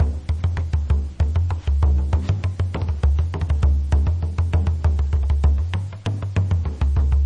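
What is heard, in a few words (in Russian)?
соло на барабанах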